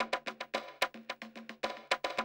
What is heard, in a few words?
110, Classic Drum Loops